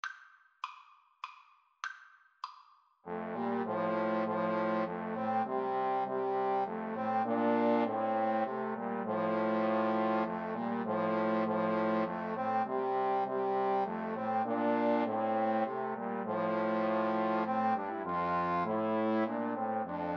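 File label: come thou fount 3 trombone karaoke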